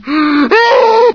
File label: zombie voice idle; Source Engine; video game; npc